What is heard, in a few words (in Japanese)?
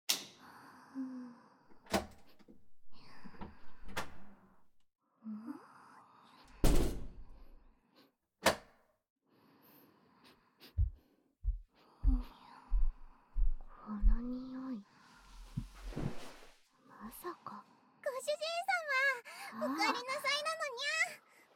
両耳舐めグルーミング; 両耳舐めグルーミングで癒してあげる; ASMR